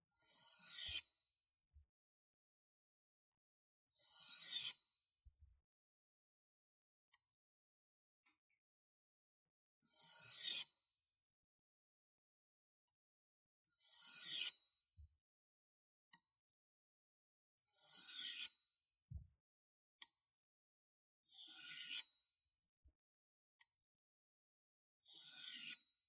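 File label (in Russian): подвеска слайд заточка меча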